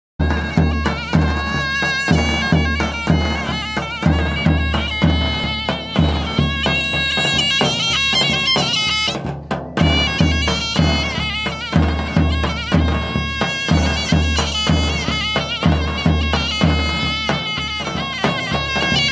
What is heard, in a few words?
timpani; ساز دهل